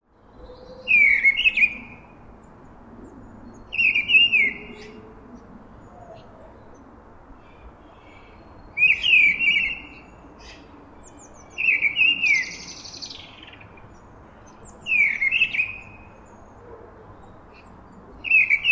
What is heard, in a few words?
mistle thrush